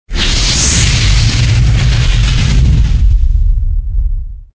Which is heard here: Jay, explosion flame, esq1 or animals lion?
explosion flame